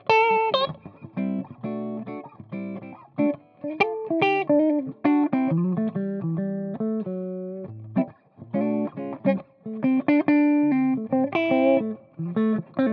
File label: wgs et65 clean; laroqua 212 studio test